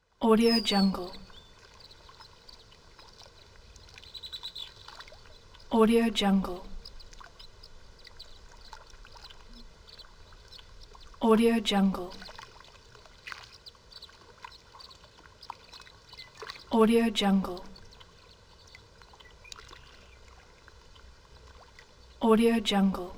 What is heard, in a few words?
lake birds